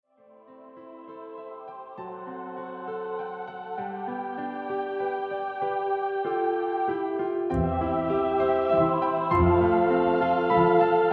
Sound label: flugel